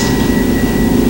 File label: HYDRAULIC; Northstar; The Wizard VOL-1